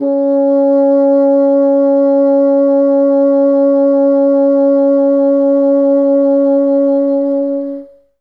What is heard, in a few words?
Bassoon C#3; Best Service ProSamples vol.51 - Classic Orchestra 2